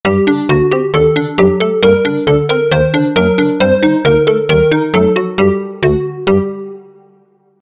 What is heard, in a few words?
switched